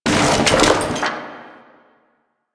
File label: Chair Destroy Misc; ambience; Warframe; Entrati